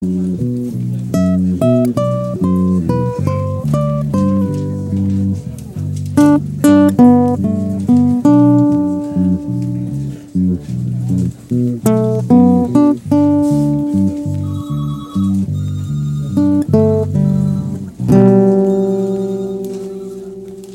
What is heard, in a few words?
instrumental ringtone